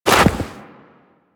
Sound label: Walk Deep Snow, Footsteps; Warframe; Operator